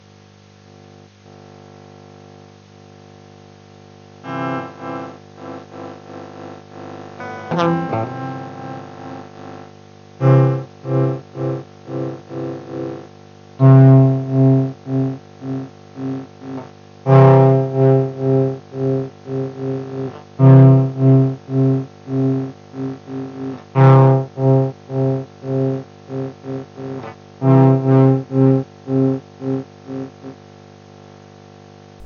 guitar

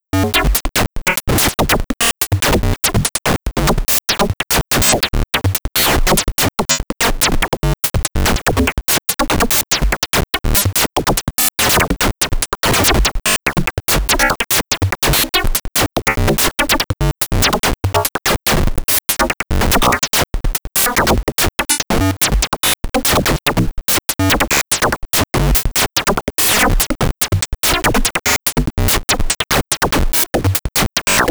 dirty idm base

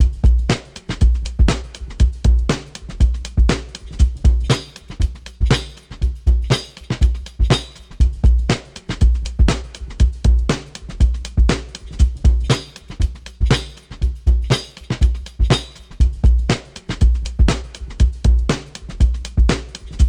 Bata Groove

